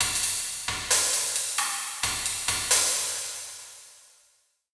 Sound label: drum kit; Diffusion; Processed